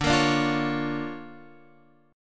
D Minor Major 7th Sharp 5th, D, down, Guitar Open F; DmM7#5 strummed